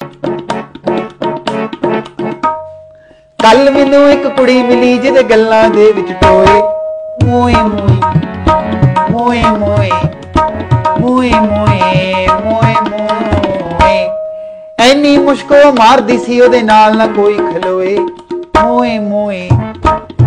Desi Version Ringtone

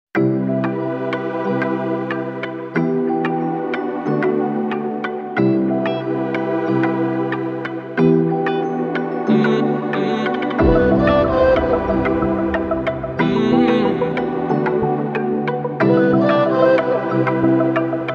Instrumental Ringtone

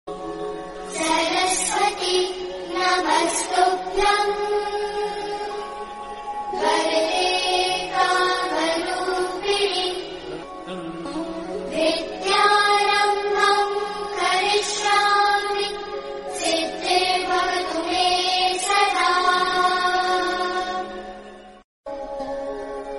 Mantra Ringtone

